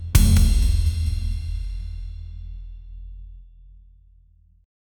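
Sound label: cinematic percussion build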